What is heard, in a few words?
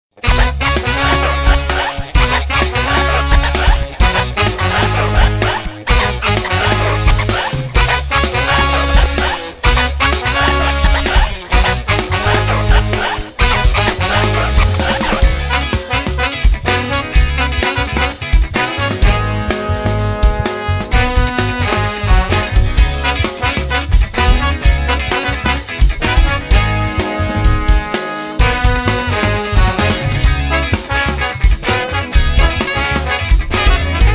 reggae ouverture